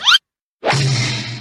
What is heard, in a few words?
cartoon slip and fall